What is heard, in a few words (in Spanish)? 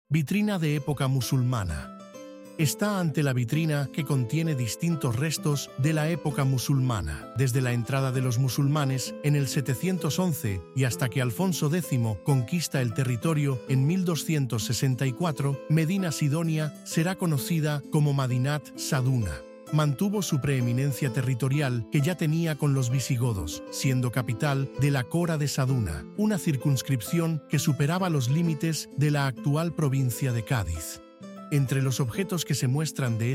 audioguia música